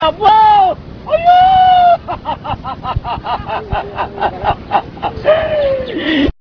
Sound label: wail